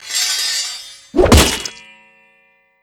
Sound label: Pommel Sword Hit Gore Whoosh; Mordschlag Mordhau